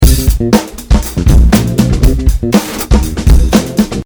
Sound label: loop